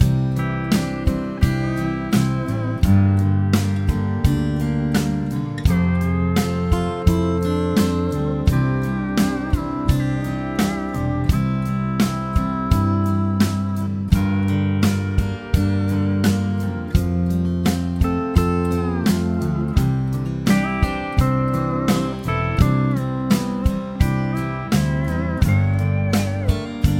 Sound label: chord prog with mixture